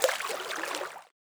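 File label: Pool Sound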